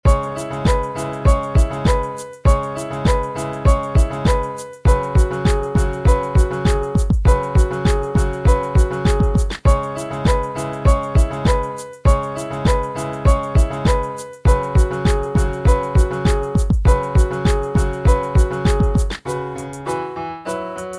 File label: Pentatonic tonic